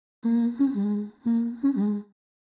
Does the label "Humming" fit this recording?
Yes